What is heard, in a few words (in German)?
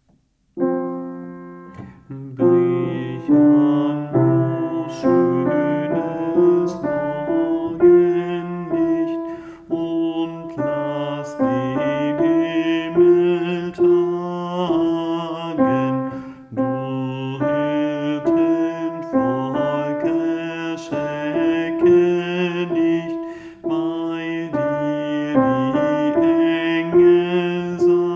Brich an Alt